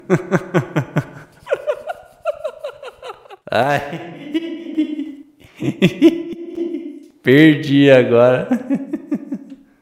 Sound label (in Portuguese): risada 8d